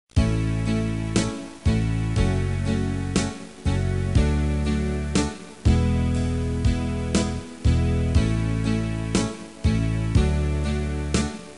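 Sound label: soprano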